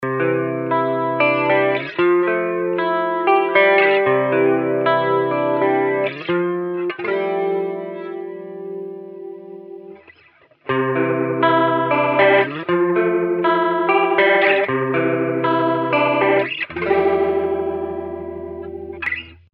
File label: Rotary drum